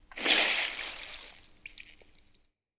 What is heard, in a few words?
Sponge Squeeze